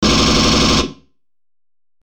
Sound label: M41A Pulse Rifle; FXHome; Bullet and Gun